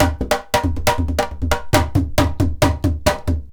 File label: IJEILU GROOV; Best Service; World Colours